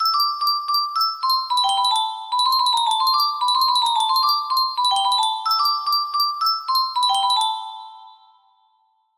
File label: music box